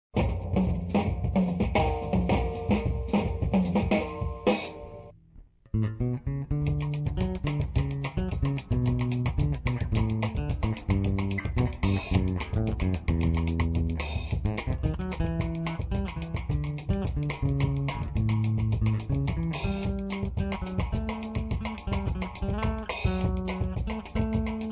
samba

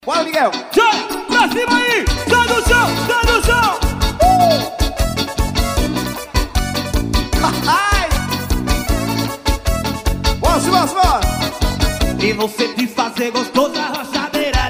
arrochadeira; lata folia 2010

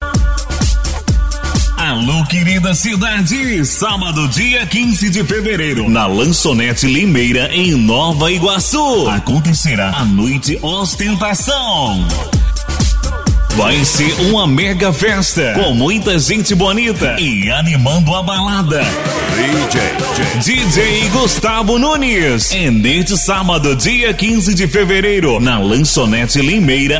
vinheta

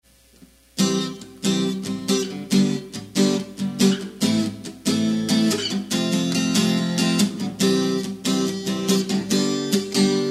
voz violao

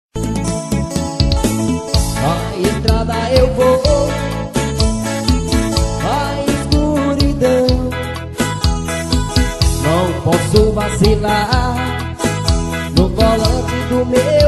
arrocha e forro

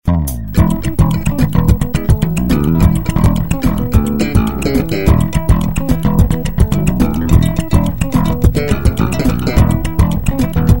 tema funk

